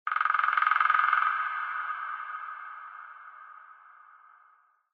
animal call woodpecker; Source Engine; tf; lair

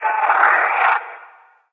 advisor screen; episodic; Source engine

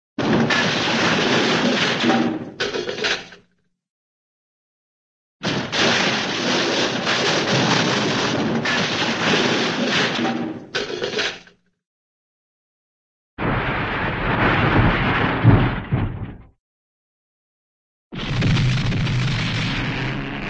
crash, cartoon large junk crash

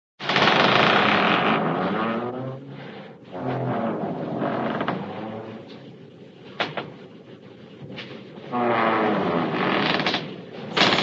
creak, ship, wooden ship creaks; cartoon

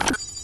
reload push, weapons; Source Engine; video game

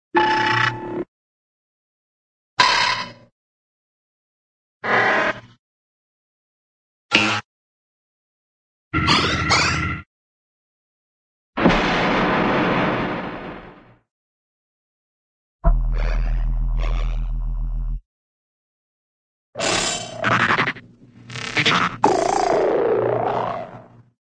sci fi space effect; cartoon

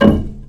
wood plank impact soft, physics, wood; source engine; left 4 dead 2

